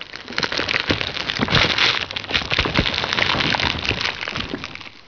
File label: dig out; svencoop; theyrhungry